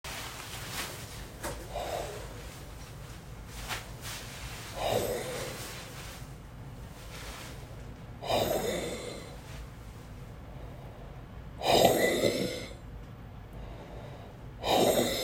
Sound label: Huff too forced